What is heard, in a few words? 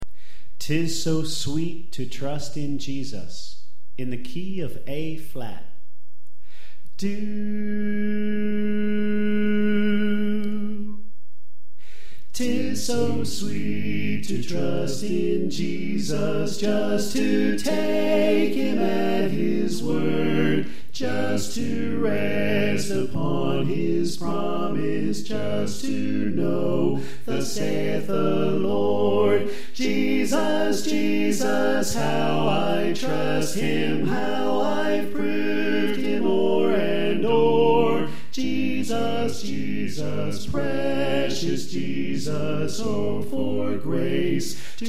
Bass Left